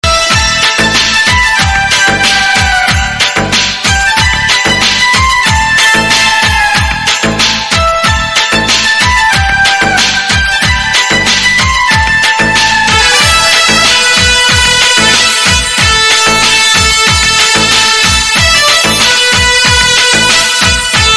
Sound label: gitar new, ringtones